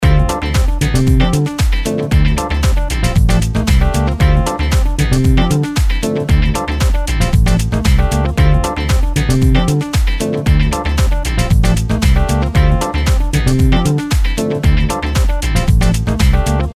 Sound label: simple bgm loop